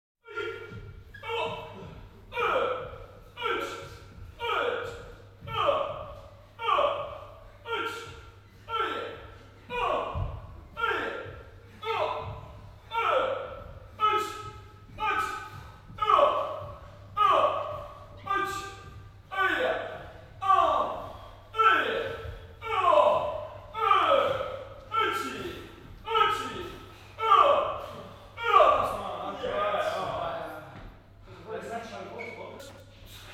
karatetraining; Sport

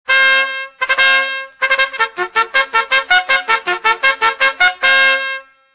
fanfahre

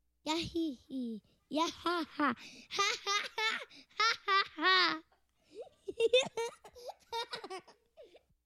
kleines mädchen, Lachen

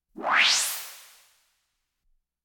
elektronengeschoß, Science Fiction Geraeusche

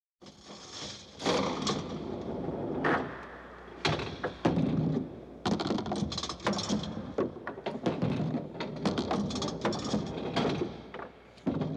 spielautomat mit kugel; Gluecksspiel

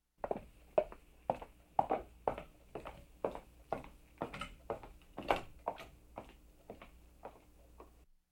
auf holz laufen sich entfernend; Urwald

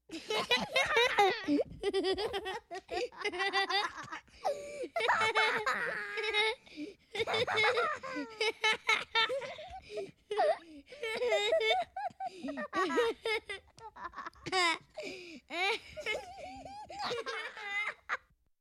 junge & mädchen, Lachen

